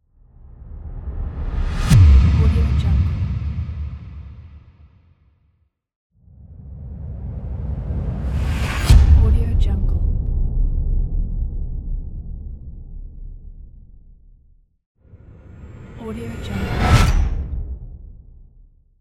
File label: whoosh impact; victorysound